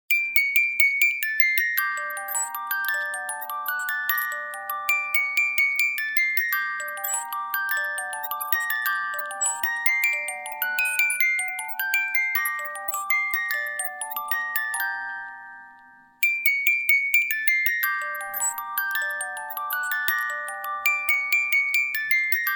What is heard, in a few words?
Fur elise music box